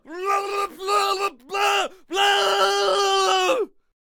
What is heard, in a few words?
Brawler Attack Vocals